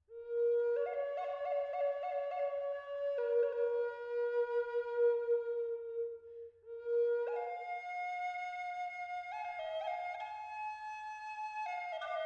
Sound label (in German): Walnuß B mittel 432Hz